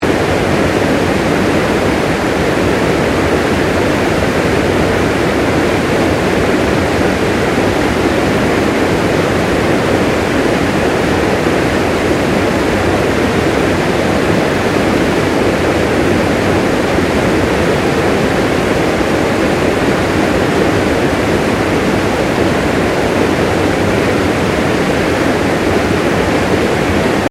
Water River Fast Flow Loop, water output from old mine; Nature